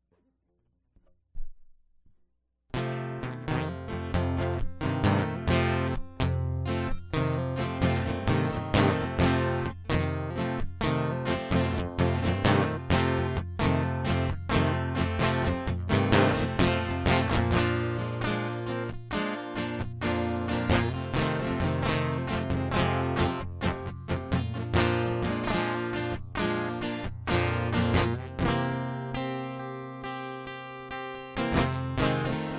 Instrumental

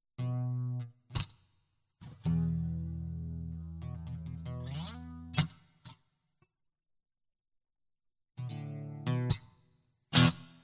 High Speed Guitar Loop

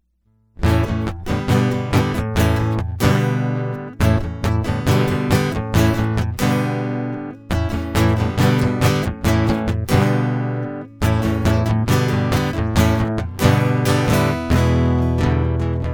Guitar Loop

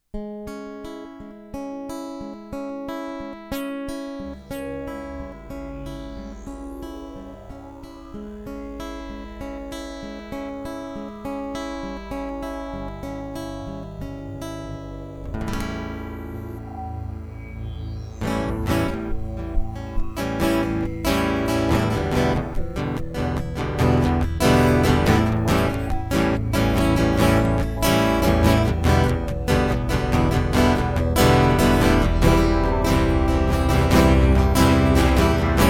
Interlude

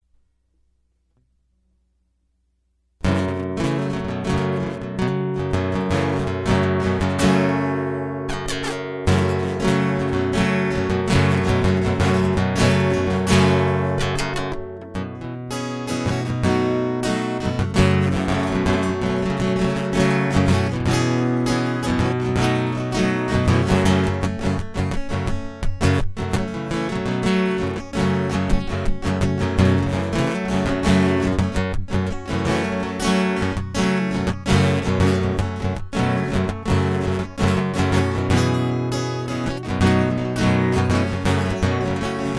Rust After Rain Guitar Loop; hyper terrestrial

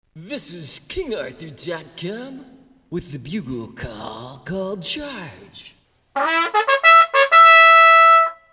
Bugle Call Charge

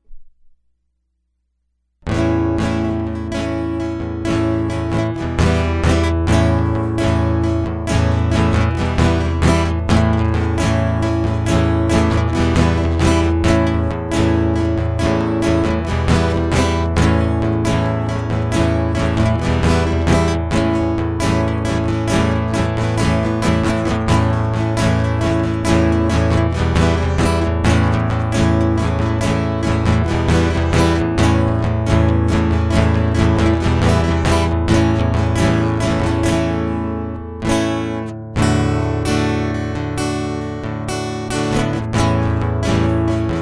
Guitar Loop; hyper terrestrial

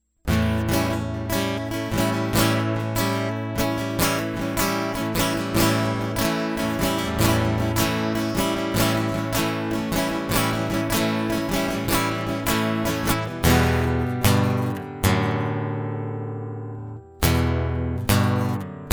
Guitar Loop

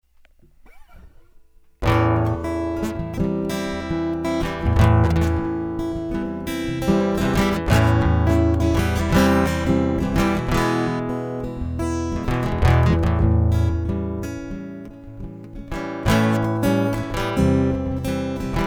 Instrumental

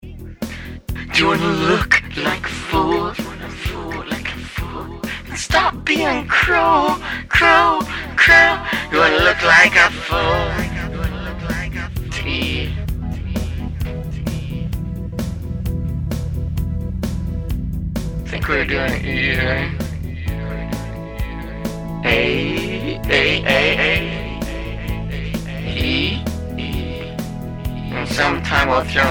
Soundcheck

